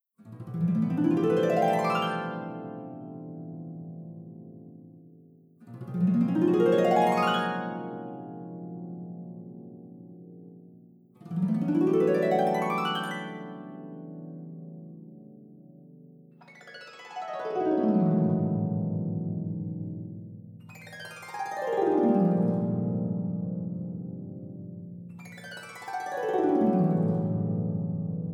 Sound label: Glissandos